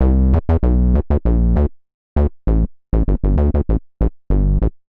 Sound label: Slow Pull Bass A Minor 97bpm; MIDI Clips; Bassline; Ableton; Factory Packs; Chop and Swing